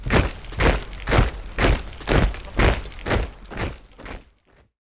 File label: troops marching; rifts; Stellaris; astral planes